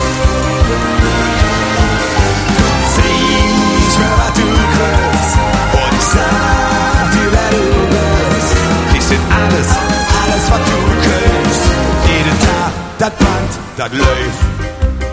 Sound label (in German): live mit orchester